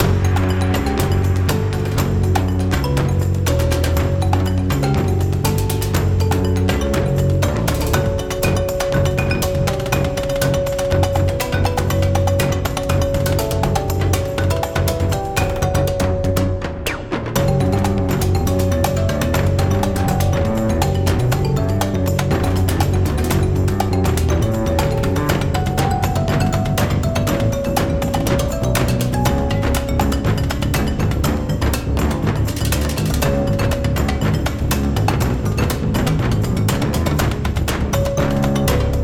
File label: Bass and Percussion, Looping; Dungeons & Dragons